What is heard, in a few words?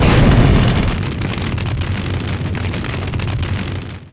lava cannon; Tanks